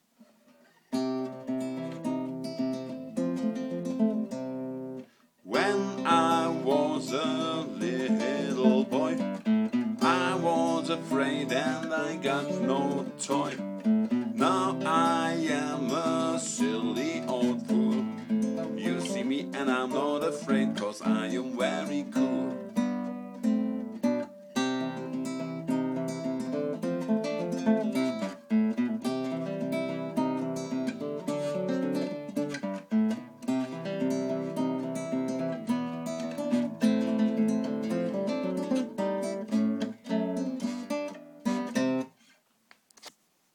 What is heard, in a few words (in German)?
Ragtime mit Text